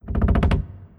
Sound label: Wood Creaks